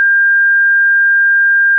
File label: tinnitus